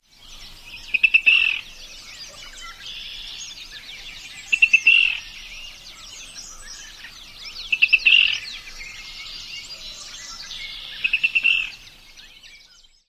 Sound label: k-k-k-keerrr call